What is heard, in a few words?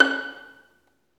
STR Violin, Solo Violin FX